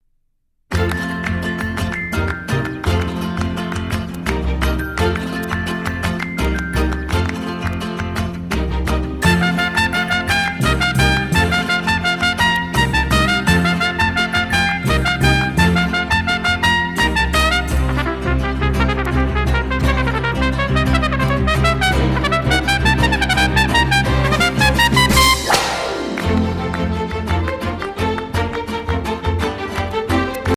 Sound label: jingle bells